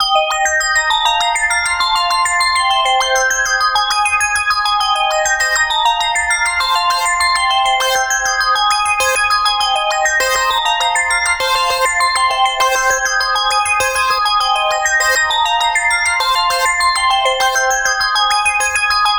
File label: escort quest; 2021 eclectic music pack